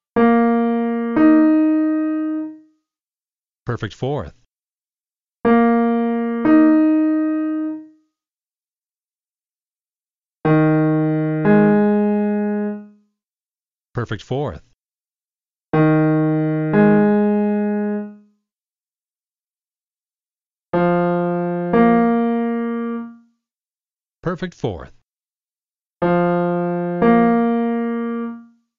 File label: Perfect Fourth up